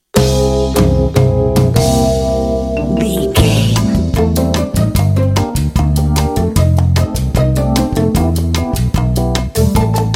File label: kitchy elevator comedy lounge